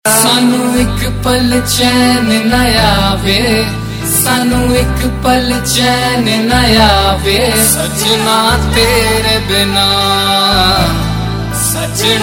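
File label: Ringtone, Punjabi Pop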